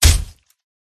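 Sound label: werewolf attack contact up; oboroten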